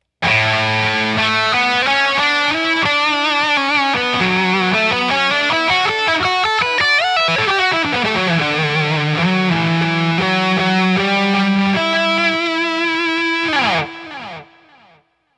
Sweet Lead

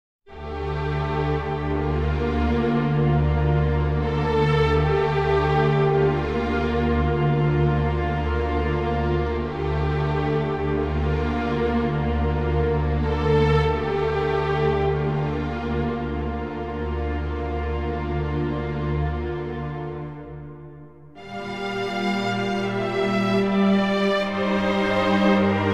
ensmbl string

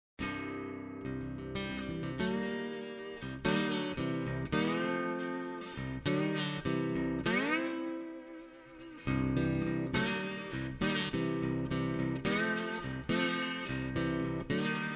small slide

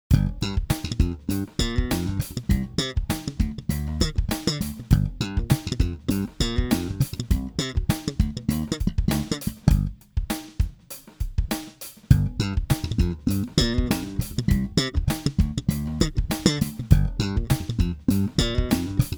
Thump; BH500

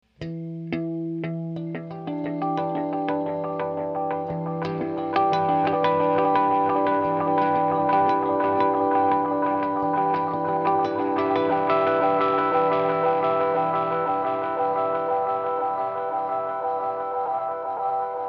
tape

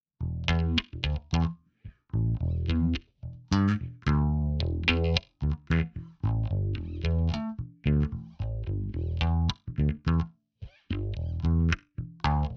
bass; pod xt

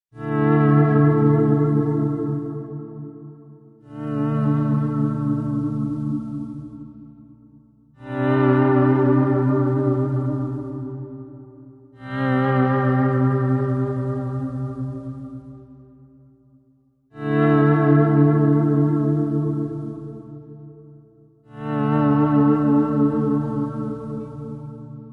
clean

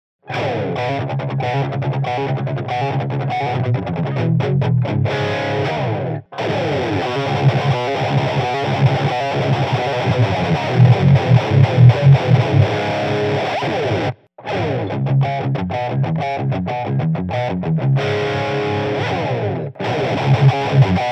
Rocktron Third Angel Distortion

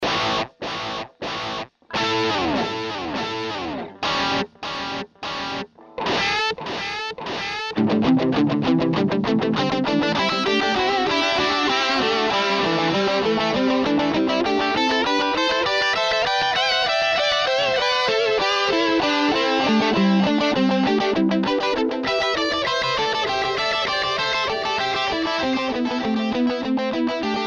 classic cross delay; korax 3000

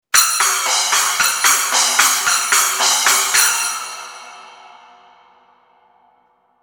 Sabian AAX 9in Max Splash Cymbal Performance

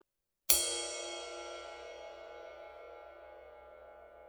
Bow

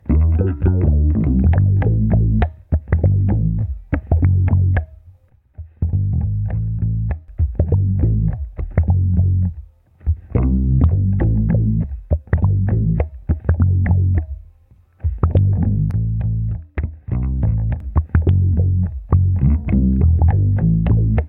Bass

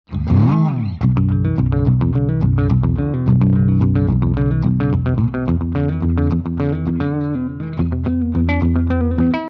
clean

